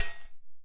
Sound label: BULU SINGLE; Best Service; World Colours